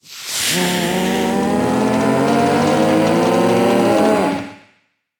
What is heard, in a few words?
charge; En mino charge; Fight Knight